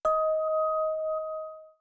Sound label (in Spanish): campana exito